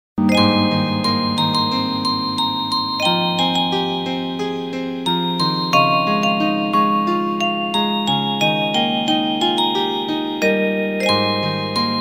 Xylo Tone

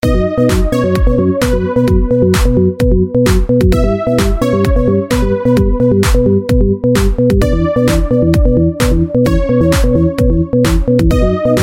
80s ringtone

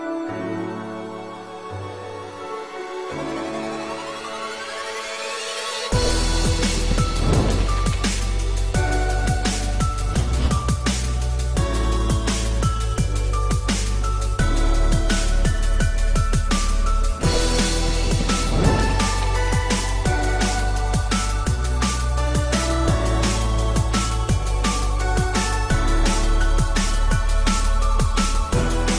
minecraft remix

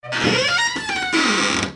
silent door, sms; ringtones

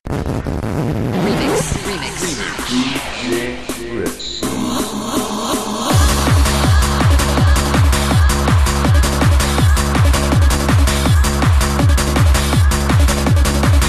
trance beats; jazz

